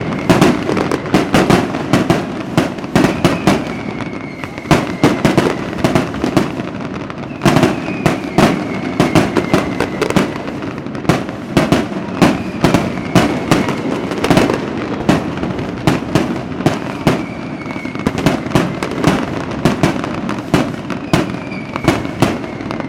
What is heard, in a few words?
fireworks display intense; sound effects one